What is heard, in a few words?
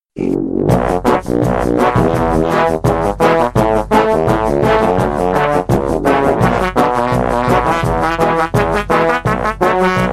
Intro jingle; Skråttcast